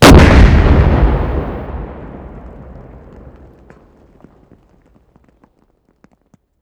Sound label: Vehicle Weapons